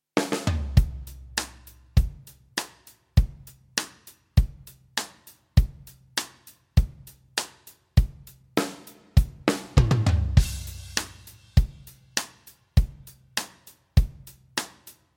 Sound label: drums 2 dry